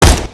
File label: AR fire; dahl; hyperion